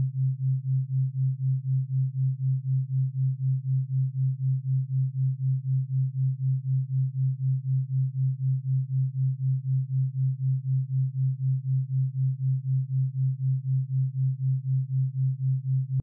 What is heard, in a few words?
4hz binaural beats